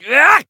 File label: attack